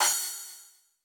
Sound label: Roland U220 Dry Set